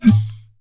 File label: Blowpipe